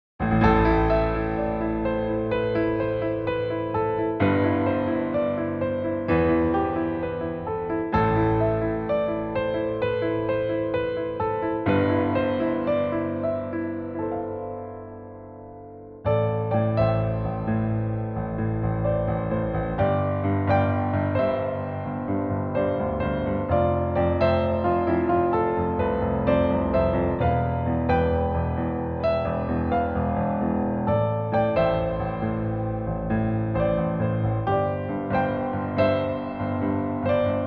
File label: Piano